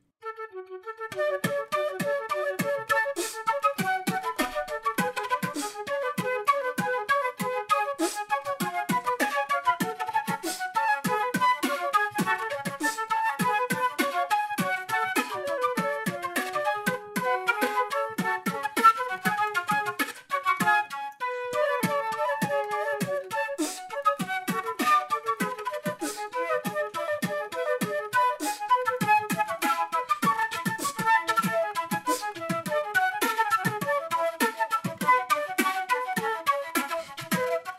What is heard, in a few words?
sinfonia 40 duo flute; duet; MOZART